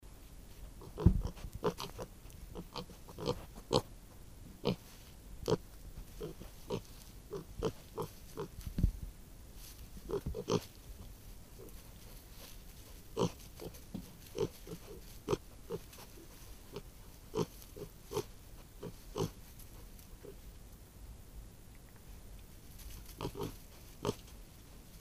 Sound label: two three-day old pigs venture out of their home to explore